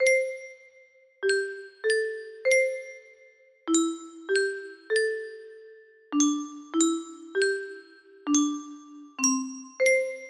track 1 soft analog

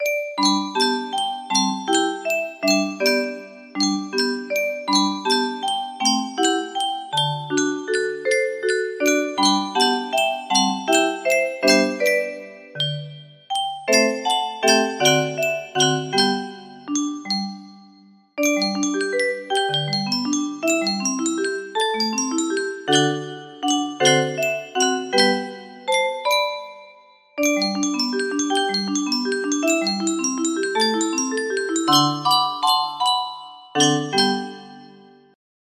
Scottish folk song, music box

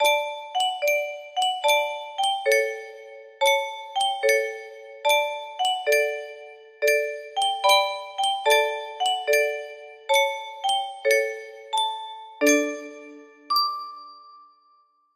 music box

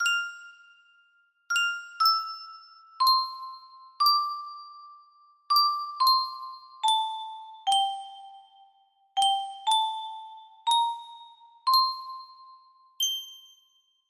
old music box

